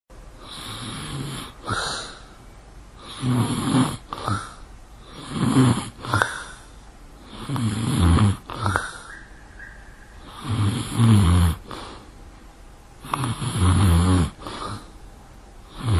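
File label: hrap snore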